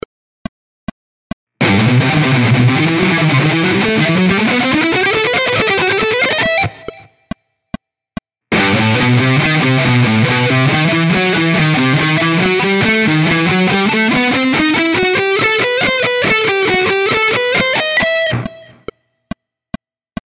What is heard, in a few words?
ascending shred, guitar